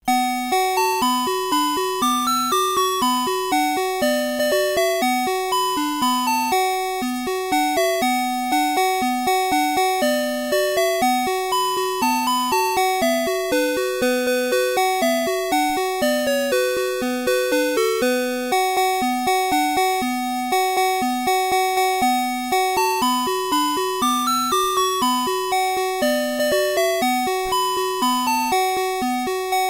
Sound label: 8 Chord A